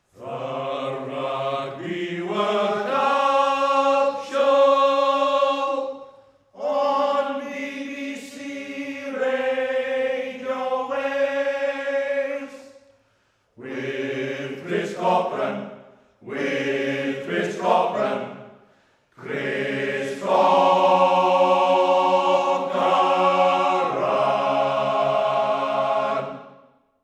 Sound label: RADIO WALES JINGLE RUGBY WORLD CUP SHOW; BBC